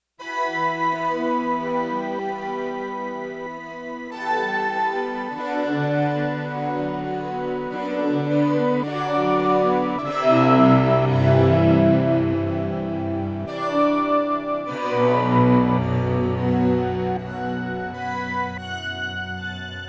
Distant Stck, synth

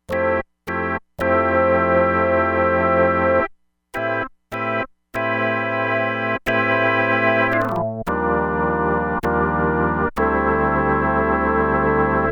VELOCITY CONTROLLED LESLIE ORGAN, Crumar Bit 99; Factory Programs

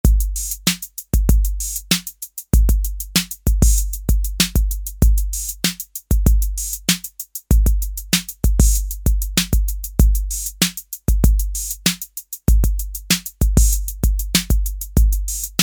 ROCK, Roland TR-808; Factory

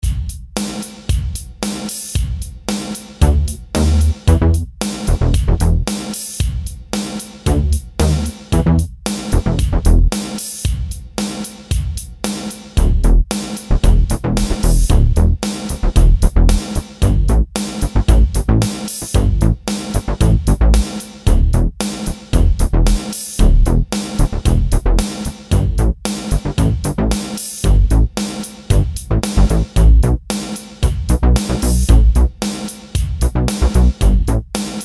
80's Bass, SuperWave P8

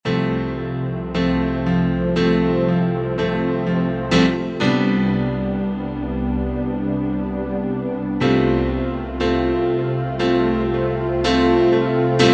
Piano Strings, Korg TR-Rack

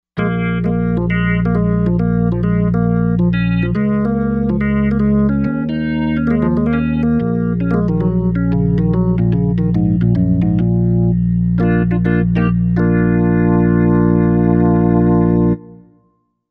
Organ Smoky Organ

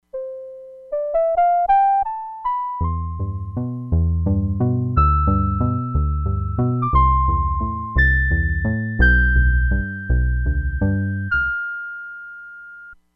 CLASSIC GUITAR - FLUTE, Crumar Bit 99; Factory Programs

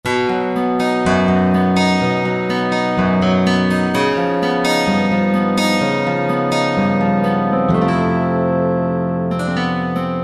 FingerPick, Korg T3

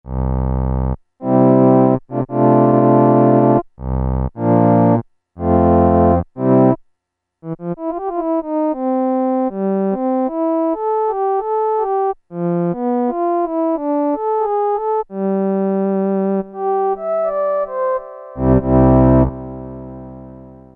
horn, Casio MT-68 preset sounds

